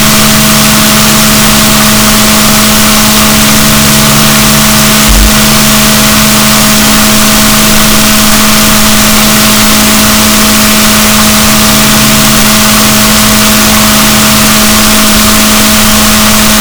rorquals positif, gaussian noise, BalaenopteraPhysalus Chile